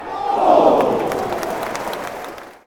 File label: near miss, fan chants; cfc